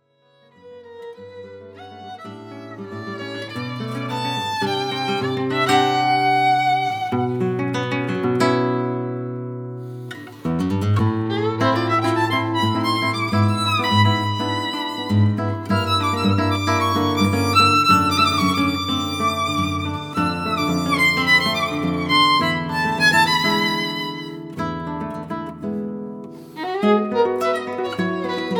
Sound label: spanish wood; wendeborn oelkrug